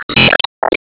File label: Bonus Balloon; Disney Star Smash; Android, iOS, Mobile